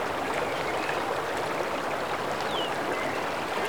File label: river loop; 1944 Battle Of The Bulge